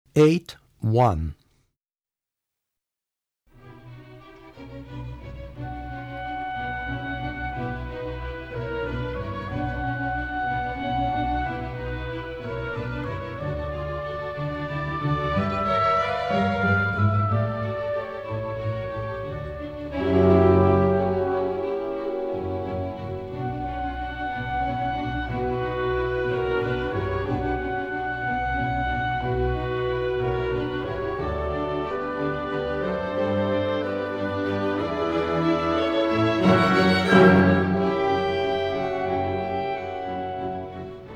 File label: oboe clarinet